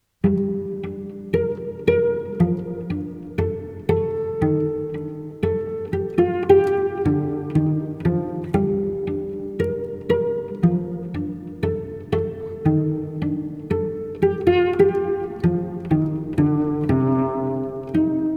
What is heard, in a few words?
Cello Solo